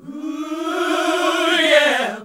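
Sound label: Gospel; Best Service Gigapack 1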